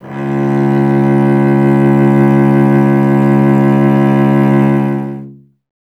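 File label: strings cello C#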